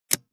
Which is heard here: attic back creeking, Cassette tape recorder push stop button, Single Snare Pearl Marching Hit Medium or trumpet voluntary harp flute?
Cassette tape recorder push stop button